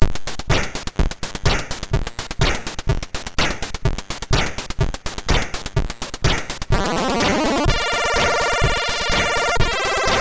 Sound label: House, sid MOS6581R2; Svetlana Tovarisch